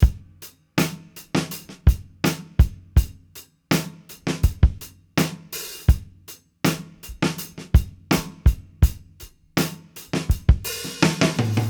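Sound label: 82 bpm, beat loops; Zero-G; Monster Beats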